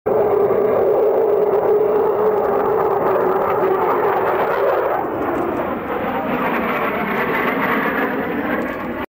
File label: SR-71 Blackbird, هواپیما